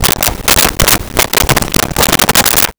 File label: Write on Cardboard with Pen; Office Supplies; 1001 Sound Effects